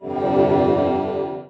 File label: temple; 帝国时代之罗马复兴